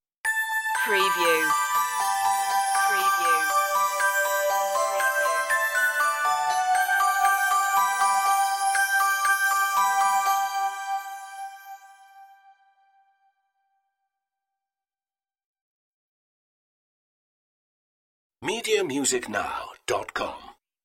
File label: merry christmas synthified stinger outro